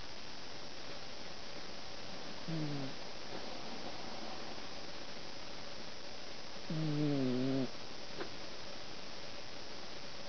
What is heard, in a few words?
cat snore; valve; dutch